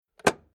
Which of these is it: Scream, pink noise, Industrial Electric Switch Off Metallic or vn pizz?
Industrial Electric Switch Off Metallic